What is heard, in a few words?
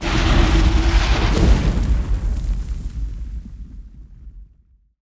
open sandy, doors stone; dragonroll